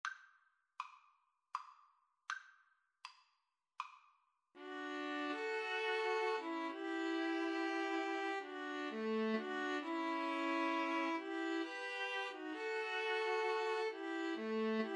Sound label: waltz, String trio